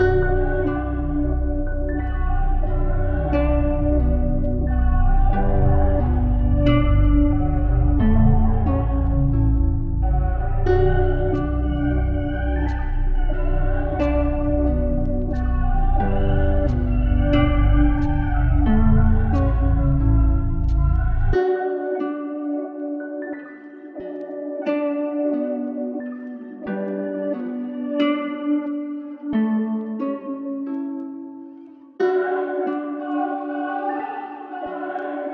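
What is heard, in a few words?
layered B minor 90 bpm; Autumn Vibes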